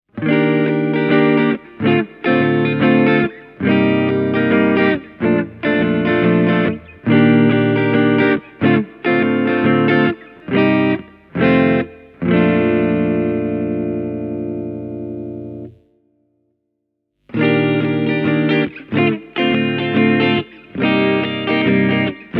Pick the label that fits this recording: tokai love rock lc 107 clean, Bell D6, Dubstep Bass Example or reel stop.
tokai love rock lc 107 clean